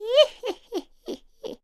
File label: laugh evil female